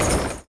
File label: droideka Roll end